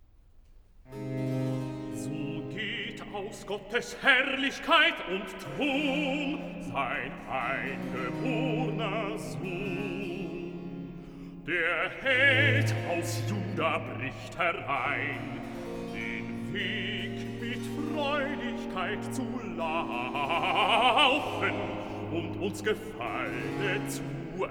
Recitativo (Bass); BWV062; Nun komm der Heiden Heiland II; So geht aus Gottes Herrlichkeit und; Bach; Cantatas

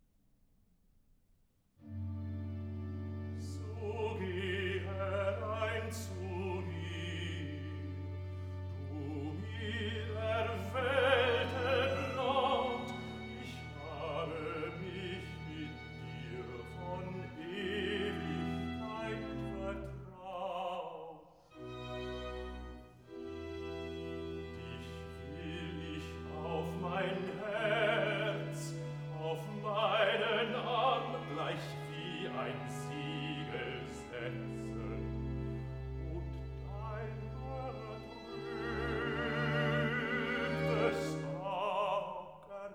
Recitativo (Bass); So geh herein zu mir; BACH VOCAL; CANTATAS; BWV140 Wachet Auf; DGG

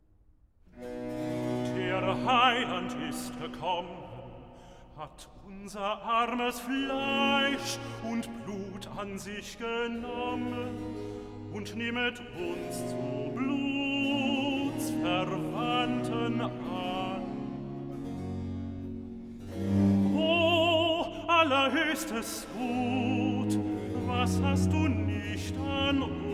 Recitativo (Tenor); Bach; Cantatas; BWV061; Nun komm der Heiden Heiland I; Der Heiland ist gekommen

